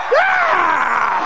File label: aaaahhhh